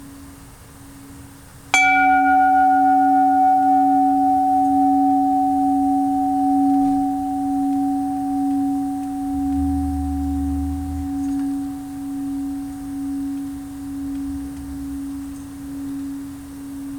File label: chime